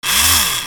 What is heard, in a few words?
Mermaid Servo Short